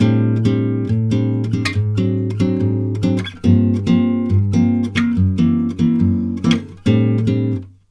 CHORDS, Brazil Bossa; USB Soundscan vol.16

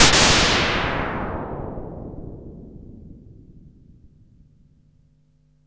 NOISE SHOT; Best Service Alpha Dance vol.2

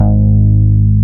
BAS MKS-50, Roland

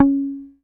APX C#4, bass; Sampleheads; Will Lee Bass Library

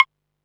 PERC, Techno 138 BPM

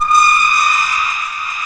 SPREAM; East West - Global Sounds

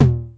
909 MID TOM, TR-909; Hollywood Edge; The Big Bad Beatmachine

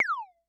Multi, Vermona DRM1-MK2

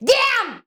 "gam", shouts; Best Service; Hyper Dance

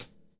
123 groove; AMG Gota Yashiki Groove Activator

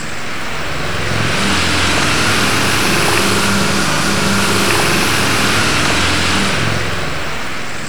loop, techno atmos

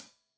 Hat Loose, Hi-Hat, Cymbals Stereo

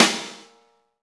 SNR, Roland; Real Snrs

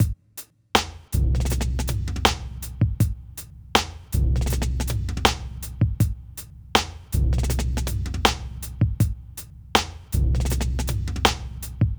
Drums, Hip Hop

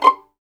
STR 2 PIZZ C6, Roland String Master Series; Vlns Pizz; Vls Pizz

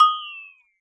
glock fx; Univers Sons - Raricussions

